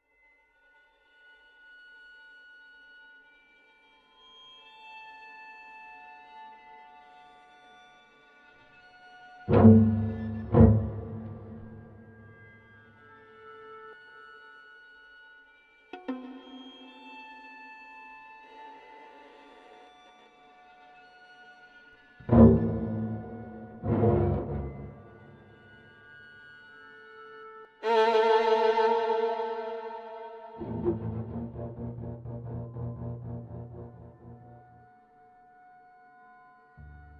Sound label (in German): geigen creapy; 1954 alcatraz windows gamerip 2014